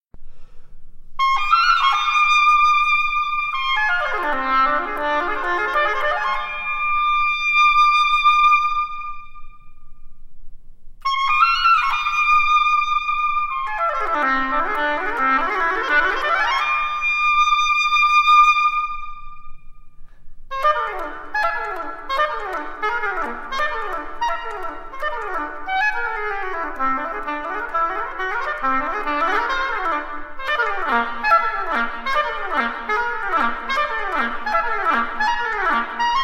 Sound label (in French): Hautbois